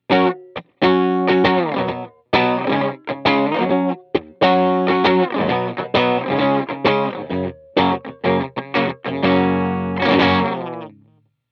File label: LINE6 carilon; Helixシリーズのマルチエフェクター; helix amp model